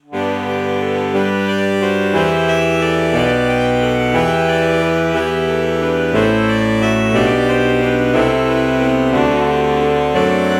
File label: alto saxophone alto saxophone morph